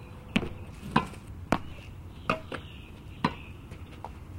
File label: tennis match; event